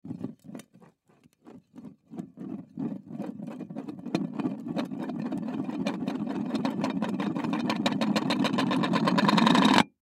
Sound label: Dinner Plate Spin; Household; Dishware; Blastwave